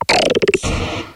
Cry; Pokemon Essentials; Pokemon Iberia V1.03

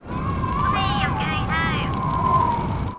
Die; ScummVM; Simon the Sorcerer Puzzle Pack (CD Windows)

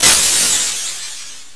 noise; easyrpg; Ib

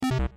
computer close; Pokemon Essentials; Pokemon Opalo

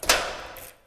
key; easyrpg; Ib

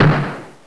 Strike; easyrpg; Ib

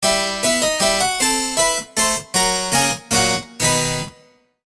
jingle; WitchsHeart; easyrpg; maoudamashii

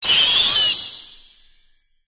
magic cure; easyrpg; WitchsHeart

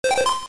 nav open; Pokemon Essentials; Pokemon Iberia V1.03

